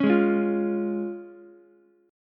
F Minor Sharp 5th F down Ukulele; Fm#5 strummed; JGuitar

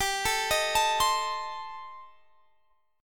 D sharp 6th Flat 5th

